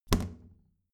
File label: plastic trash can close heavy